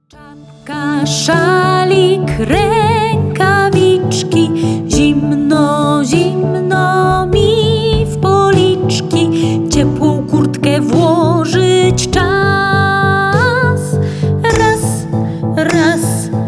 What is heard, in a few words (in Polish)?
zimowe ubranie wersja z wokalem